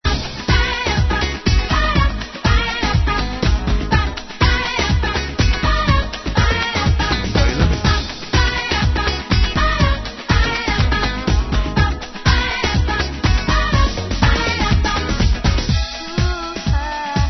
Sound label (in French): baila guitare